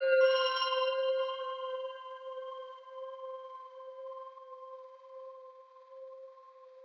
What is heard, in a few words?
BELL Nova; thermal mulitkit 2024